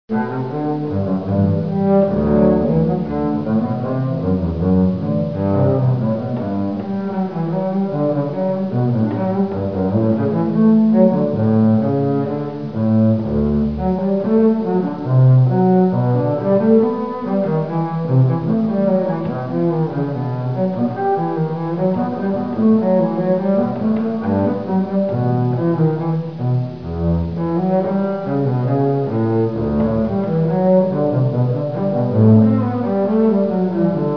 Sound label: bach, Solo Contrebasse; louis-antoine lassonde